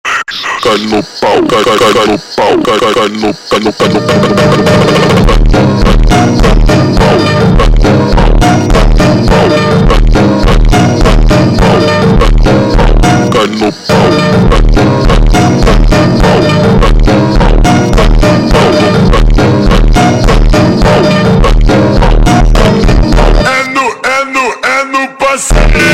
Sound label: super Slowed; funk